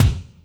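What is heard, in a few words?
kick Techno RM, Alesis D4; 300 Drum Machines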